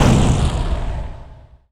Fireball impact, imp fireball; DoomRTX

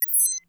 Keypad Press Beep; DoomRTX; gui

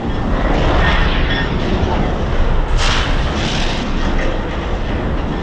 machine amb loop; Quake 4 Alpha; id software

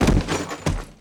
body roll; DoomRTX; commando

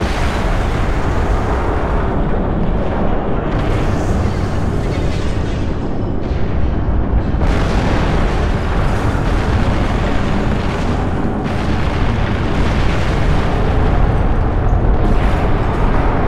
end ambience; Quake 4 Alpha; id software

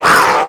imp pain, monsters; DoomRTX; imp5

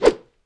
Imp Attack Melee; DoomRTX

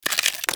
tentacles in; DoomRTX

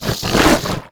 imp attack melee, monsters; DoomRTX; imp5

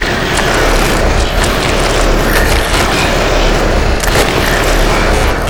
gore machine; Quake 4 Alpha; id software

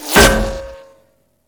metal imp, ambience; Quake 4 Alpha; id software

